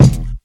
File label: rich mids kick drum e key, kick drum sample tuned to the E note; kick drum samples